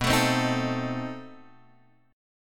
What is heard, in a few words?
B 7th Flat 9th, down, Guitar, Open G